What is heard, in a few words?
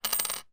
money coin 50p uk down on table; zapsplat